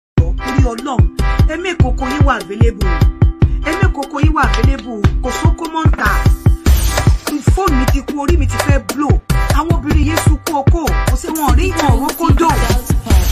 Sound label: Mara Beat